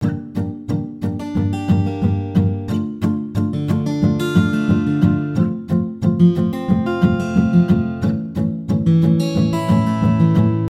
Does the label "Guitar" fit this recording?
Yes